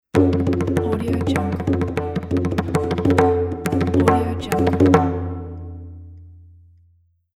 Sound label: tabla beat effect aggressive